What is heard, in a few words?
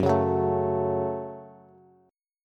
Gb Minor Gb Bass; strummed; JGuitar